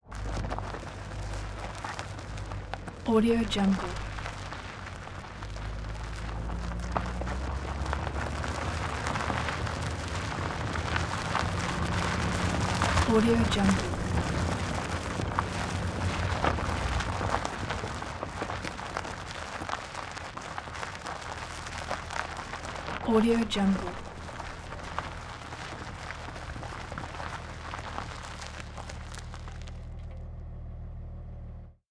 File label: vehicle gravel tire pass by constant no engine